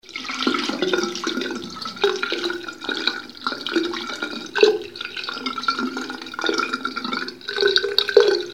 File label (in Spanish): Agua corriendo